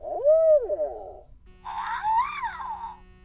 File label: owo; BB Retail Therapy Sample Pack